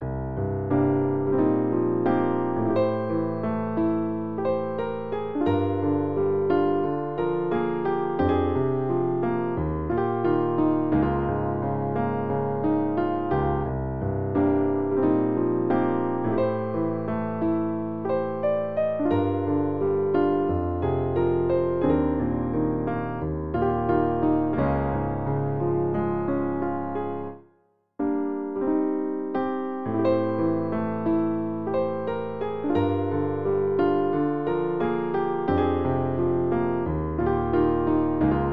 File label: Piano; Super Smash Bros. Brawl